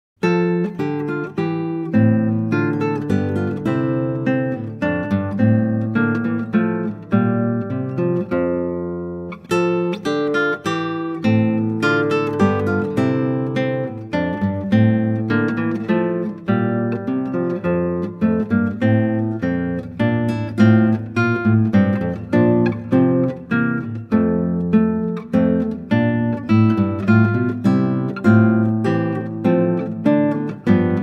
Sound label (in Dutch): Klassieke Gitaar; Bach 50 Solos